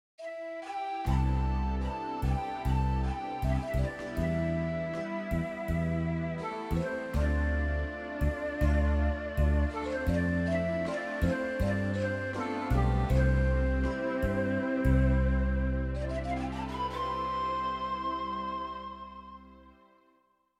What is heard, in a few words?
Shakuhaco; Roland V-Accordion soundpack best of brass